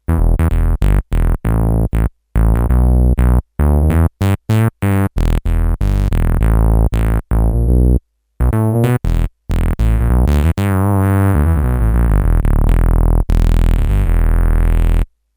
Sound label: Knarz Bass, M-Audio Venom